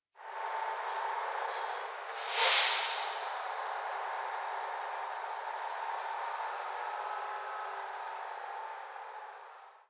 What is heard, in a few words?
truck drive; ambient